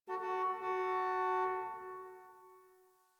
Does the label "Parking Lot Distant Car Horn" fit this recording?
Yes